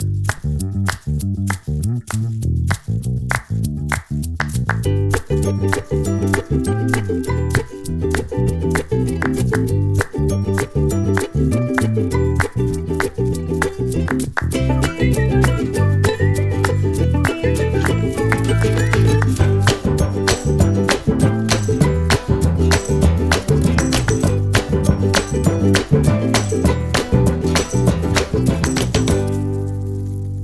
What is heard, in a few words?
Sound logo; ING